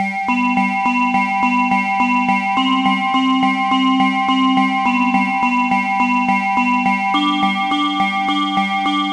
PPG Vibe Loop

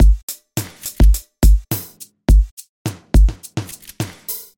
Hip Hop Acid Loops

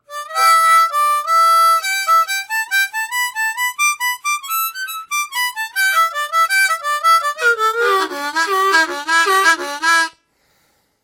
Harmonica Scale

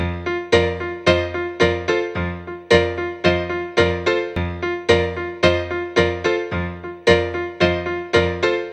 Piano Blues F

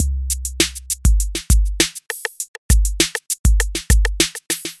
808 Pop n Bass

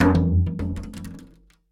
Plastic Bottle Drop

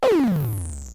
Korg Bass Lick

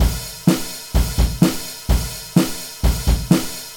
Heavy Rock Loop

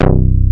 Moog Bass Hit

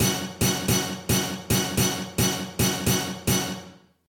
Pitched Hits

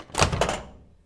Microwave Door Open

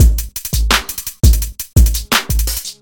Crunk Hip Hop Loop

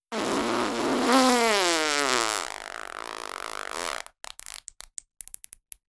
Fart Sounds

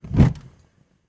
Catch on Fire Sound

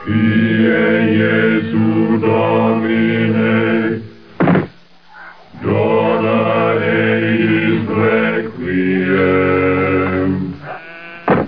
Monks Chanting

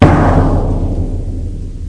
Huge Drum Kick

